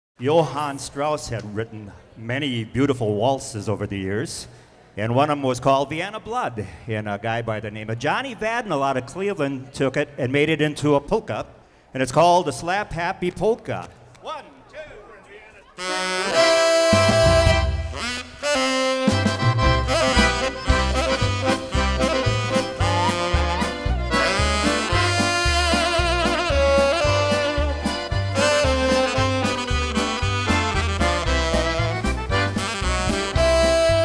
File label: Polka